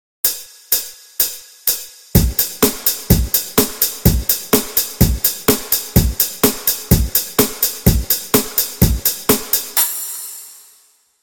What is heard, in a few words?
8beat